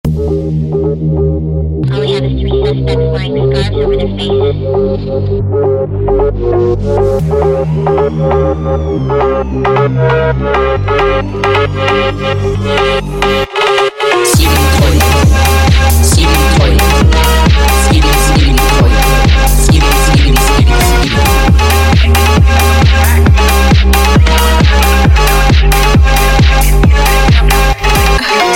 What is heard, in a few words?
Phonk speed Up; Skibidi Toilet